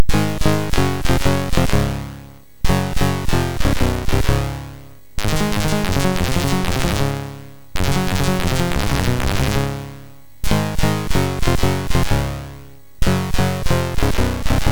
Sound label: sid MOS6581R3; Sampler 1; hvsc